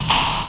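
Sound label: JINGLE 120 BPM; MACHINES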